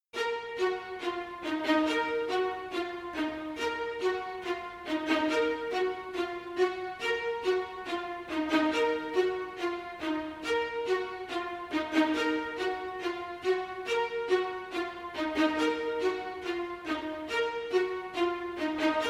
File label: rise strings short